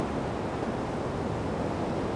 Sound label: surf